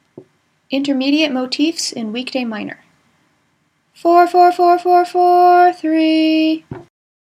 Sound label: weekday minor intermediates, nusach